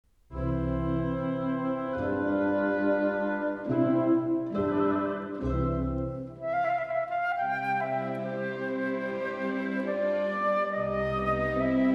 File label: Andante for Flute and Orchestra